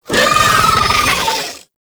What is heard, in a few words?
Summon Vocal; Warframe; Uriel; Gulphagor